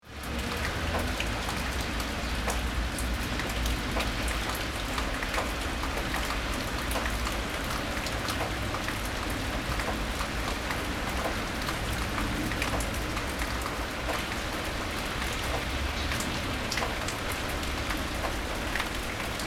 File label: City ambience urban traffic rumble wet road